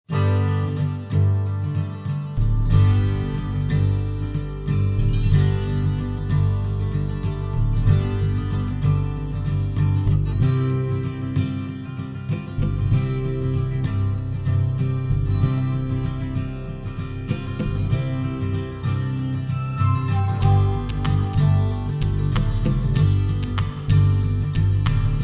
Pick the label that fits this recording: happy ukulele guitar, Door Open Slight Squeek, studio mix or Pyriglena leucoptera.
studio mix